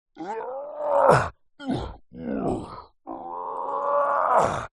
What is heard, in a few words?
fantasy giant male vocalisations lifting struggling pushing; zapsplat